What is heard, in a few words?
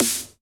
Snare